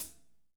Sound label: foot